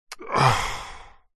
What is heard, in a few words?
human male grumpy angry frustrated sigh; zapsplat